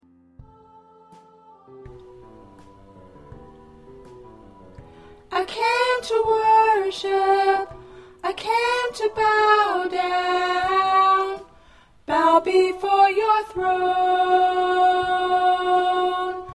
Alto, choir parts

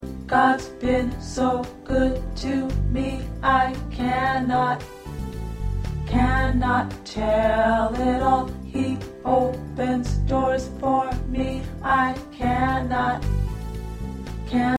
Tenor, choir parts

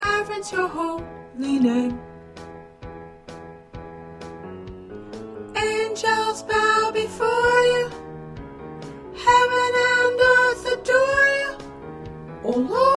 Soprano, choir

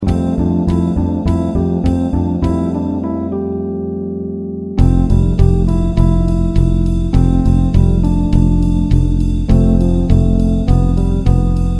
Accompaniment

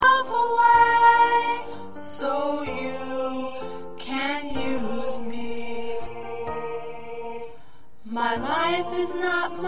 Soprano, choir

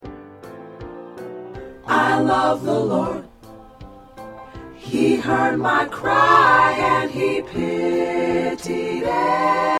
SATB

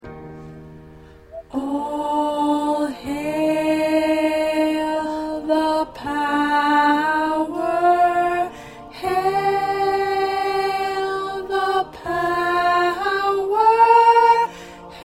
Soprano; All Hail The Power GMWA

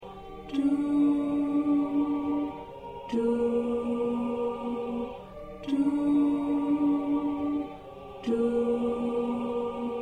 Tenor

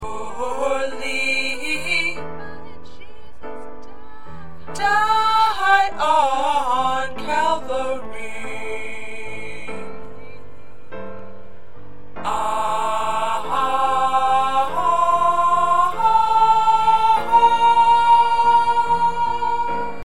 1st Tenor

